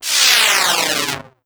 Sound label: Rocket; Hi Tech